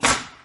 nailgun

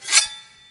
sword unleash

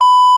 censor beep

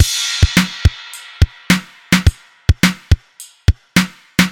break, drumbreak

